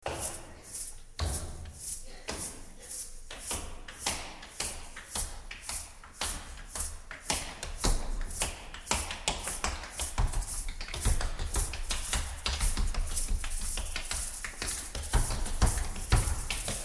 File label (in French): promenades concert orchestre symphonique jazz contemporain experimental improvisation; musique; claque